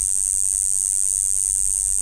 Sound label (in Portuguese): cigarra